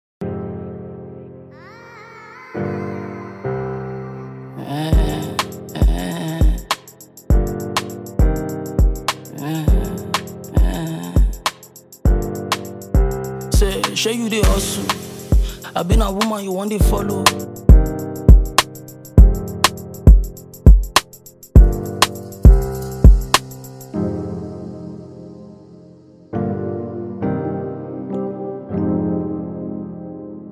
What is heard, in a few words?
Instrumental